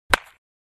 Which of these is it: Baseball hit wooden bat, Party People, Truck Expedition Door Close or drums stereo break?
Baseball hit wooden bat